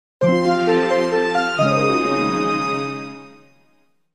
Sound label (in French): Mélodie du Vent; The Wind Waker